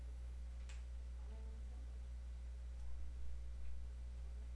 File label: crush